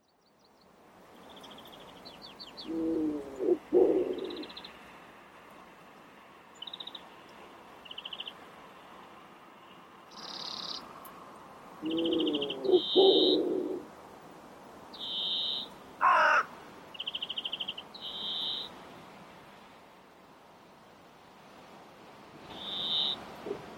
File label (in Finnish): viherpeippo ja kaverit; linnut